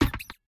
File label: Hammer Kick; game audio; Mita; MiSide